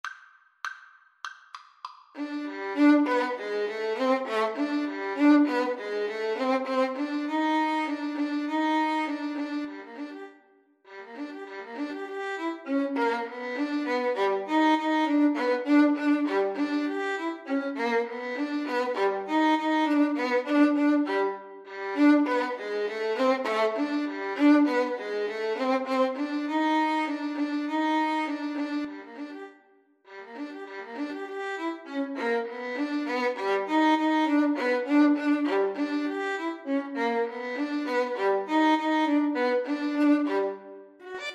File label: maple leaf rag violin duet